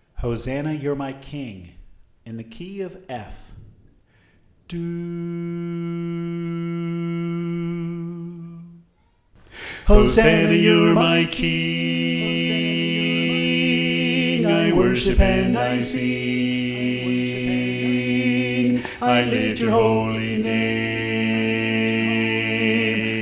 Bass

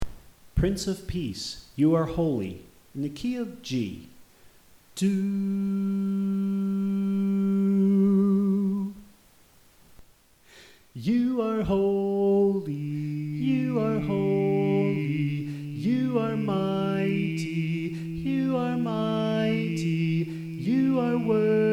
Bass Left

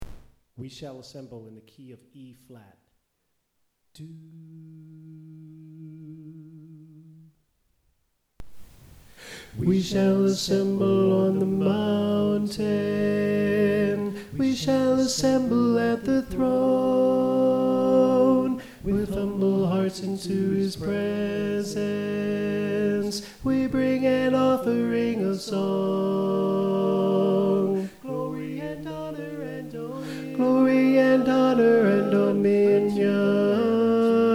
Tenor

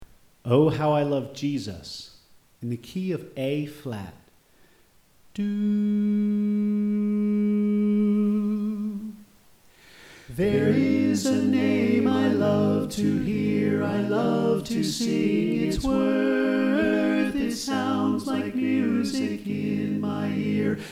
Bass Left

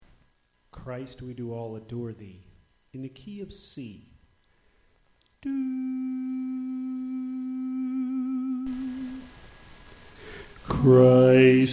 Bass

